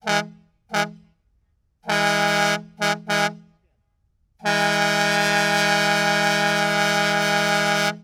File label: Big Rig Horn Blasts; Sony Pictures Sound Effects Series; Period Vehicles